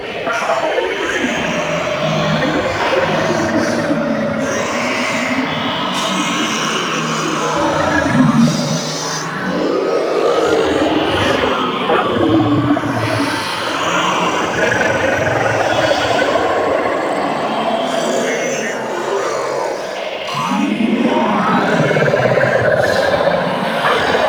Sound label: Armour Integrity Failing Loop; Ambience; Warframe; Infested; Golem Trial